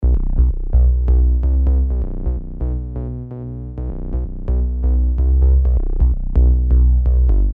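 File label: 128 B Synth Bass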